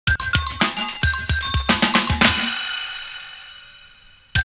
bomb 112, drum loops, old school; X-Static Goldmine; 90 s Sample CDs

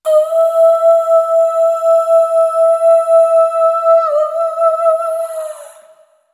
Single Voice Melodic Loops, Vocal Samples; Pocket Diva World Class Vocal Samples

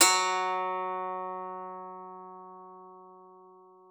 PSAN F#3, PSALTER; Xsample VOL-8

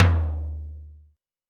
Tom Lo Clear, Alesis D4

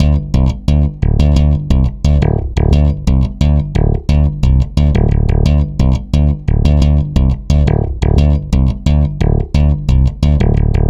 Stick Loop, Stick Bass

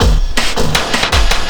Drum & Bass, 160 BPM

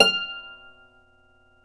Celtic Harp, Plucked Strings

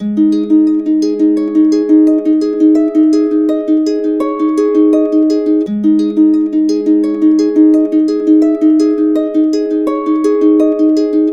DRAMA, Complete Harps; USB Soundscan vol.62; AKAI

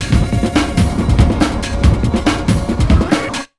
RUN 140, Big Beat Drumloops; AKAI

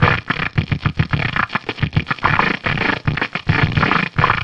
Antimatter Perc, Processed Drumkits

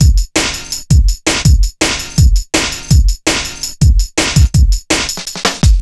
165, Drum Loops, Drum & Bass; Total Drum&Bass

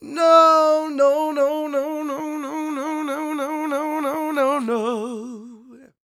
SOUL, Vocal Planet, Blues & Soul; CW SOUL

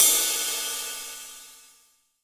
22INCH ZIL RIDE, Ride Cymbal

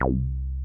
TB303; Zero G; Man Machine

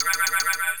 SFX, 170 BPM, Drum & Bass; Ueberschall; Drum & Bass Flash Trackz

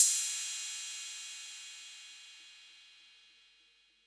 808 Cymbal; Concrete Collage Drum Kit; Concrete-808

